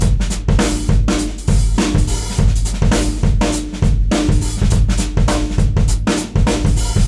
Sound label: OFFMIKE DRUMS; Masterbits; Dance Trax